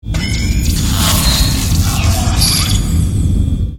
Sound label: romulan replicator